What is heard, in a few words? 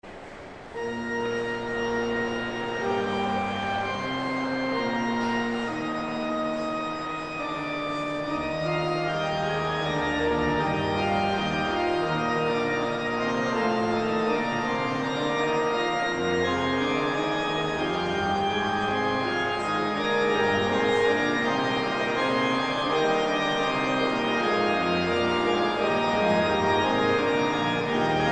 Organ, Fugue in E flat; Bach, J S; St. Anne; BWV 552b